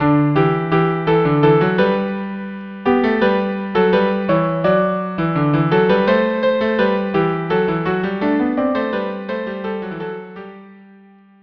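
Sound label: viola cello duo; now is the month of maying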